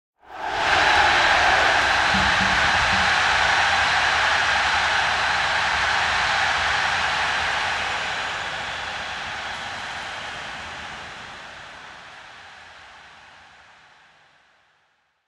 Crowd Goal Shouts Large Sports Stadium Crowd - Drums; Hollywood Edge; sound designer's toolkit v2